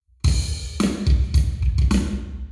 Hall Drums 90deg; eMagLS

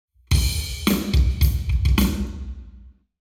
Hall Drums 0deg; eMagLS

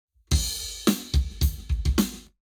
Lab Wet Drums 0deg

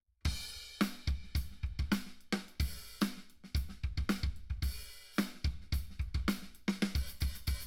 drums binaural

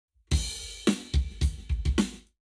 Lab Wet Drums 90deg; eMagLS

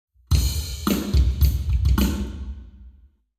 Hall, Drums 0deg; eBreve

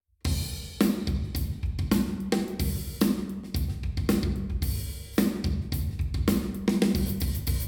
drums binaural, big hall; tetramic

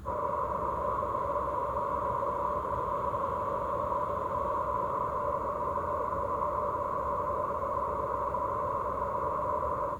Noise AVAS, electric vehicle

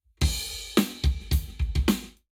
Lab Wet, Drums 0deg; eMagLS

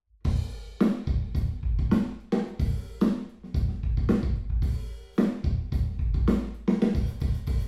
drums, binaural; em32 Aalto

